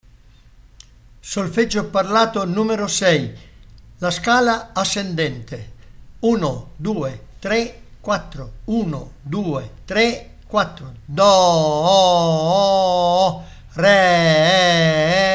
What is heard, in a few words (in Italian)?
Solfeggio Il mio amico flauto